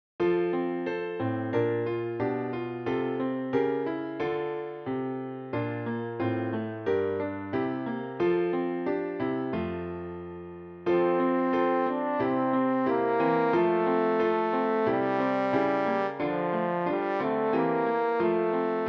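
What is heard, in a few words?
horn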